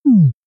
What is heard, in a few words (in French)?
bruit echec